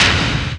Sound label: movie, impacts & hits; Techno Trance Essentials